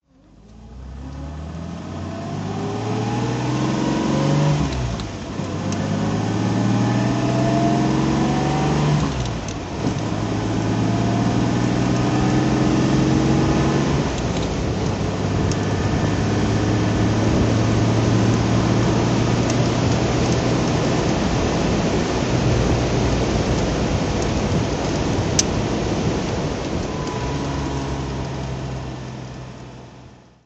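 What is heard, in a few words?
Jaguar E-type 42 ots s2 drive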